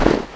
snow; svencoop

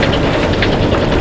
boat; svencoop; hunger; thambs

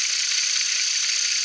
tape machine; svencoop; hunger; recorder

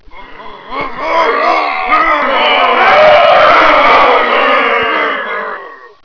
crowd; svencoop; hunger

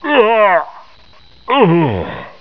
die; svencoop; hunger; hungerbarney

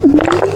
alert; kingpin

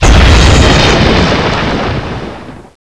shock discharge

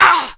pain; svencoop

